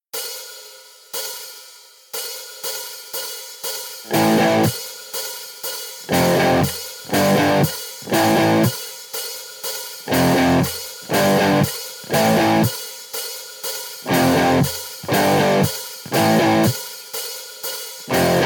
Riff Two Notes, guitar

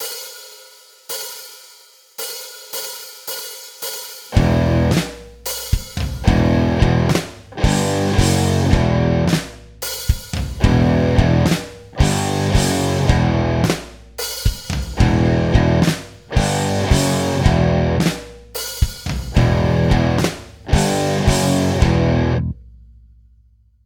Power Chord, guitar

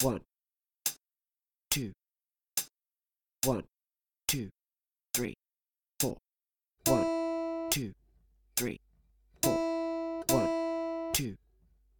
E string, guitar

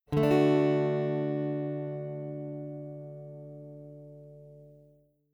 Chord Types D Major Example, guitar

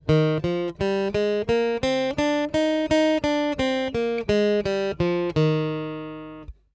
Eb Major Scale, guitar

